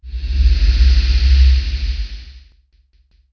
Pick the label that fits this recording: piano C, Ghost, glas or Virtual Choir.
Ghost